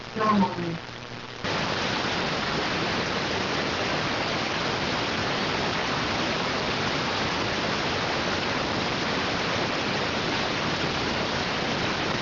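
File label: radioteletype receive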